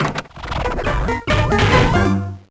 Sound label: Bathroom Jam